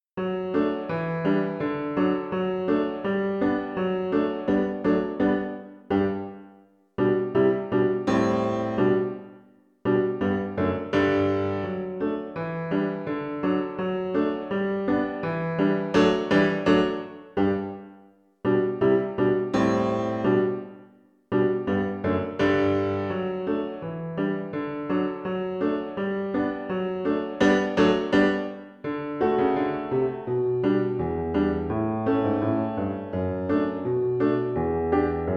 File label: russian dance